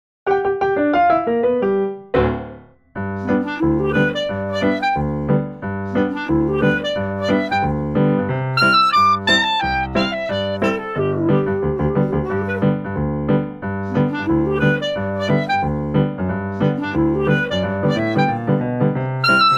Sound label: Happy Clarinet Ragtime